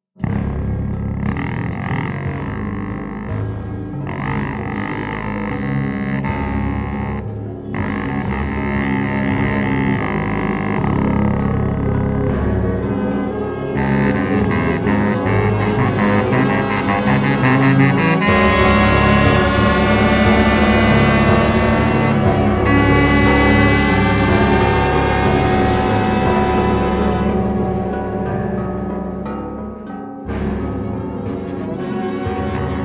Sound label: saxophone and electronics